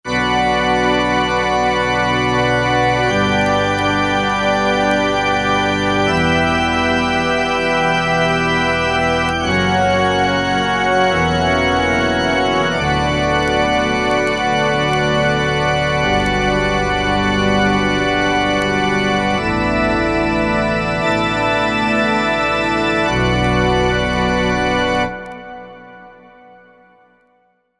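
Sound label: Phantom Organ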